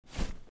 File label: Box; The Henry Stickmin Collection